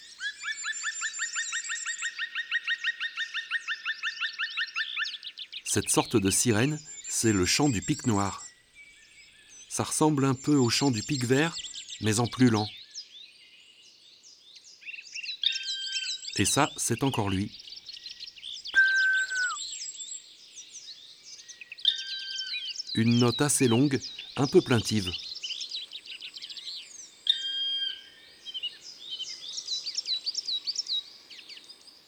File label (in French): Pic noir chant, ABC des chants d'oiseaux